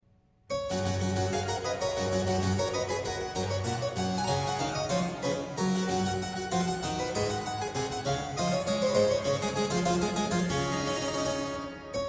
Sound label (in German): marche g dur, Marche G-Dur; bwv anh 124; clavier buechlein fuer anna magdalena bach 1725